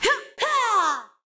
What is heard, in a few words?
High elf Warlock war idle